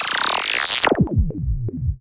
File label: Misc Synth FX; musicradar; cartoon caper